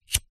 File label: household cigarette lighter light; zapsplat